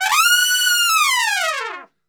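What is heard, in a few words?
F#4, Brass Super Section, trumpet; Best Service; TRP LNGFLL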